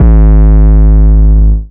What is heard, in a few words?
Hard Dist 808; 808s